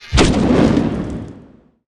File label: Bow Exploding Cast; bullet